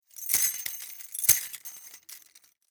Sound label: Horror Chain Rattle Restrain; Scary Soundscapes